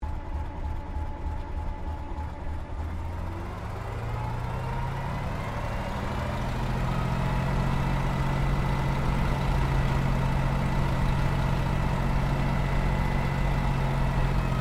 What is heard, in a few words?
AIRCRAFT P-40 KITTYHAWK TAXI INTERIOR; Warhawk / Tomahawk; Airplane; Vehicles